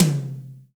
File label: TOM, Drum Dry; East Collexion; Drum 1